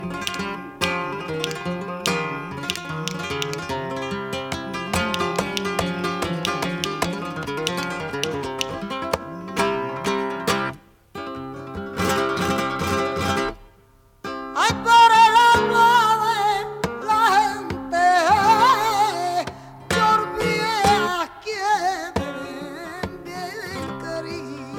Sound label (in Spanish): Solea, flamenco; de La Andonda